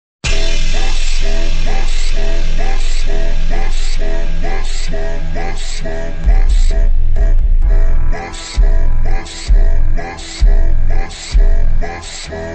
ultra slowed reverb

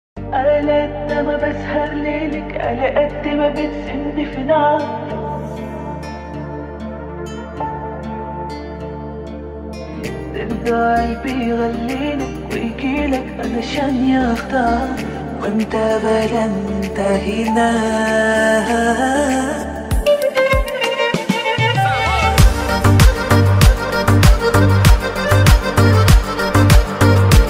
arabic music

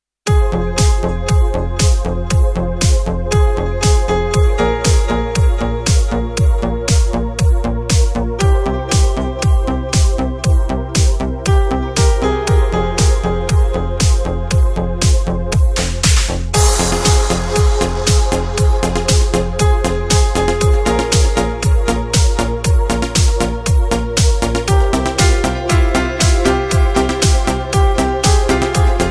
italo disco

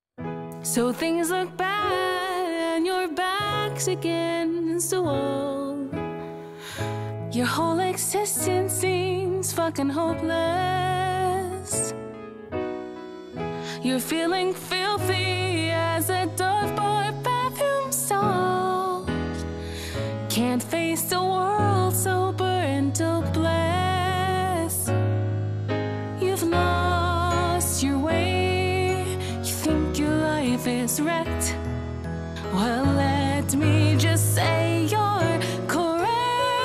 female cover; angel dust x husk

